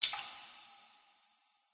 nature water drip shallow splash large chamber cave reverb single